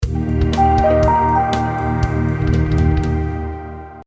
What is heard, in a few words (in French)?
Jingle court Synthé; Novia Systems